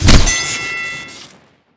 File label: boxing gloves hit crit; tf2